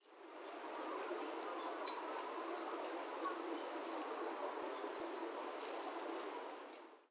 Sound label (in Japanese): ジョウビタキ